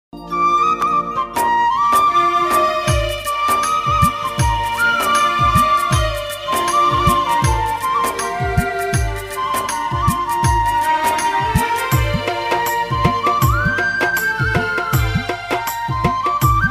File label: bansuri ringtone flute ringtone